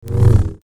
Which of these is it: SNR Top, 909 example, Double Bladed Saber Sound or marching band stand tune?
Double Bladed Saber Sound